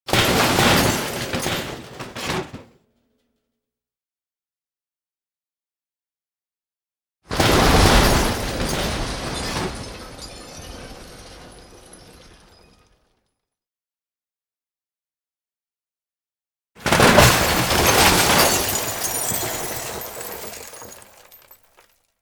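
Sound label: falling of various objects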